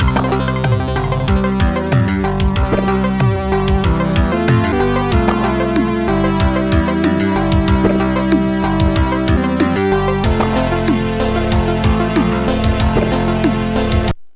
jingle; Ziriax

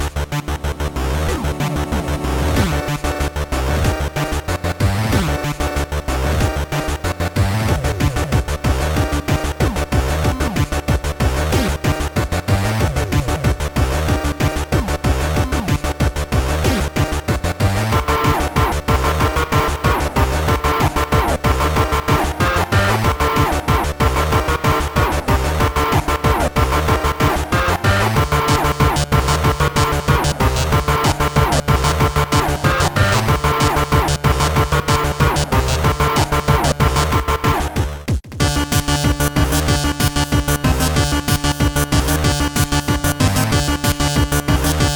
chiptune

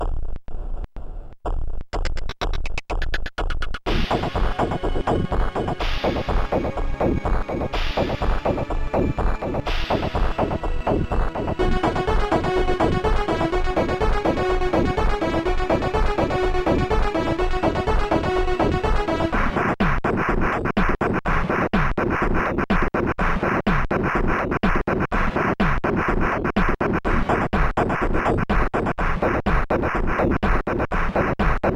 super disco; Sonix Music Driver

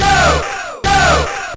beatbox; Pinball Dreams

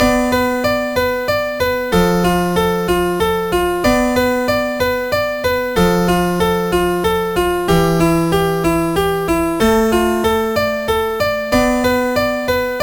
locrian; MusicLine

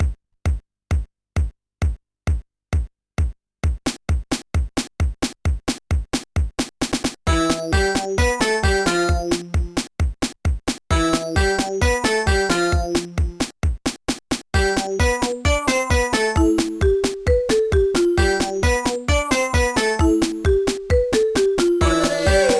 silly song; MED

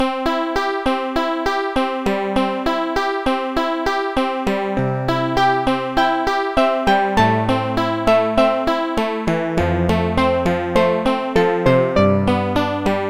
folk; The Music Studio

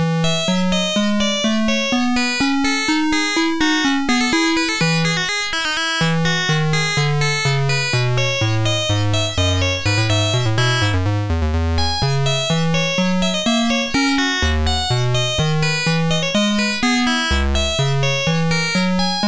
invention; invention6

